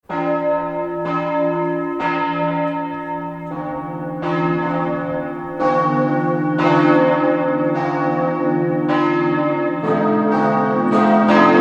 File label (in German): Klingelton; Christuskirche; christuskirche hannover